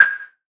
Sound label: perc pc; Drum One Shots; SD MIDNIGHT Sample Pack; Slate